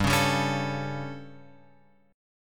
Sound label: G Suspended 2nd Flat 5th G, chord; Gsus2b5 Chord {3 4 5 6 X 5} strummed